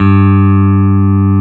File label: SLAM BASS G3; Drums In Your FACE!